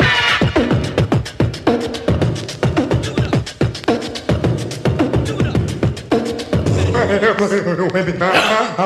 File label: brr stick em, Beatbox, Drum loops